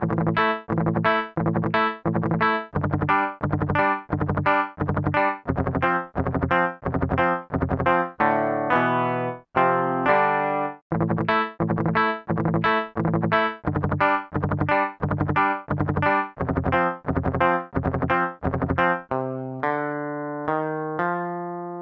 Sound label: midi